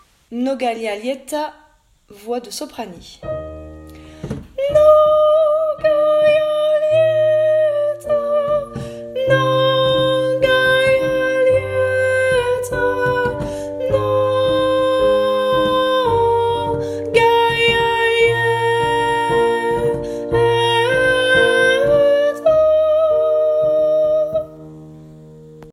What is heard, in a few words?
Mnogaia Lieta Soprani